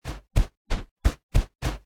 defeat kappa spin; smite; kuzenbo

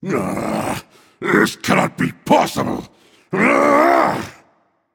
boohoo; smite; anhur

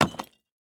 Rock Hit; smite; izanami

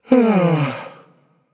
sigh; smite; agni

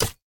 dagger catch; smite; pele

